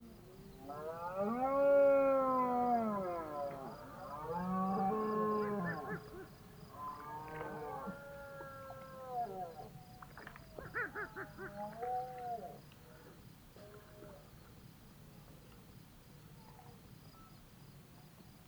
Eurasian Eagle Owl Devils Cackles Of Male With Rutting Red Deer